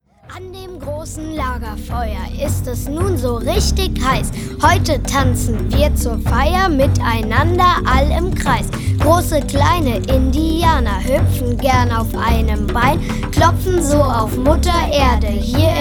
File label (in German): Indianer Trommeltanz